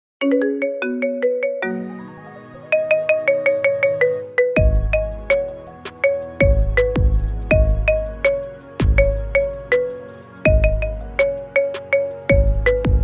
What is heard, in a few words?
Marimba Remix